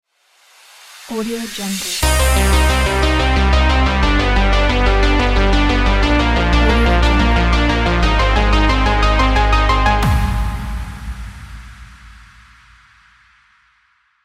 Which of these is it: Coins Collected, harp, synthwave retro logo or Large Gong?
synthwave retro logo